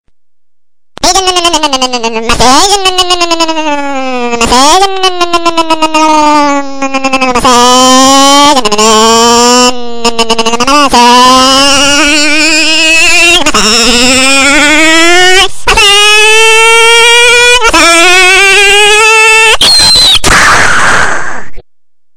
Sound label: motor message